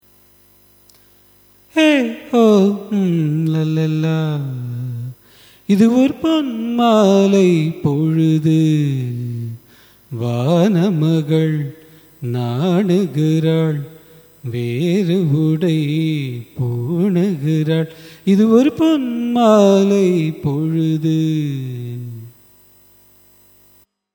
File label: raga kedaram cine; carnatic music